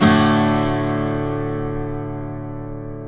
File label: acoustic guitar chords